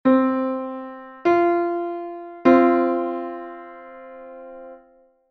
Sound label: 0-480 fourth (5-EDO)